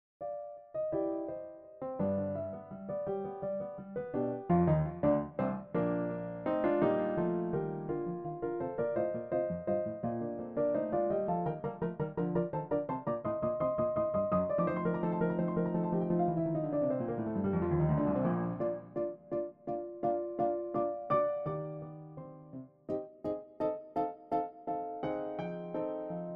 Short Piano; la seconde brève piano (1:17

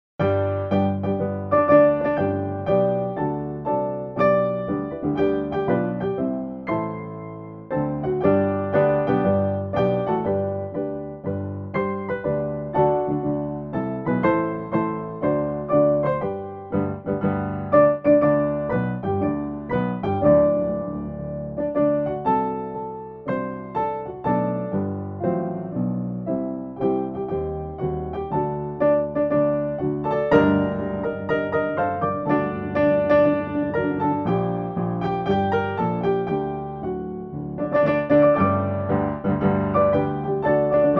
Piano Marseillaise

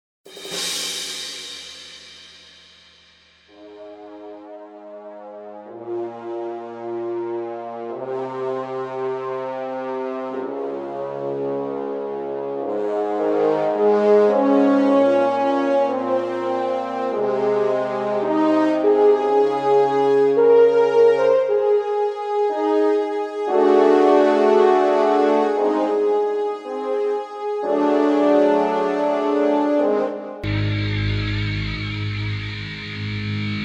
short Star Fanfare; brève Star-Fanfare (0:55

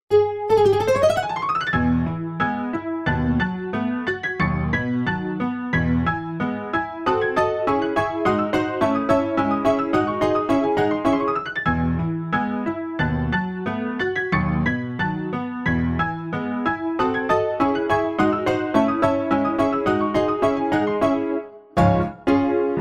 Hungarian Zither Dance

